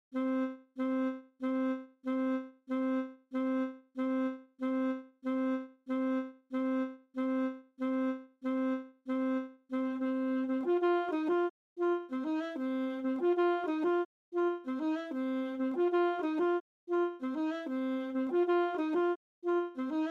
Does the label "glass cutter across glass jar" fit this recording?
No